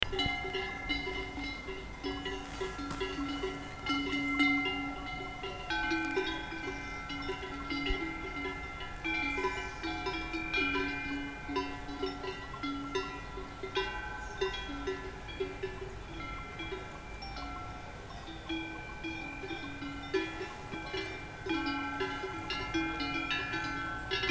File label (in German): Kuhglocken